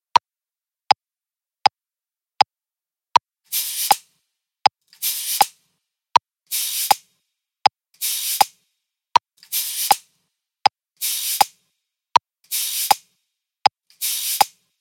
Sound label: Cabasa